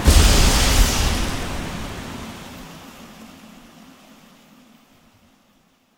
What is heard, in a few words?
water explosion